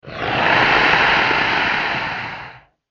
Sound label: Horror Monster Snarl Hiss; Monster Sound Effects; Monsters (Sound Effects:Horror); SFX Bible 6